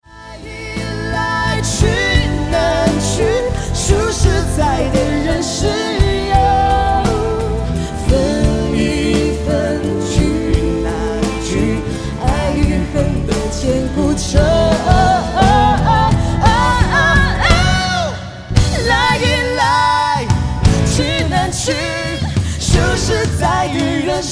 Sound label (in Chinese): 最美和声